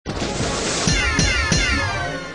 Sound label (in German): loop schlauchboot